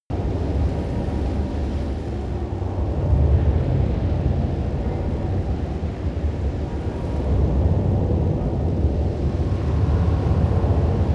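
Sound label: spaceship water planet loop, science fiction; atmosphaeren umwelt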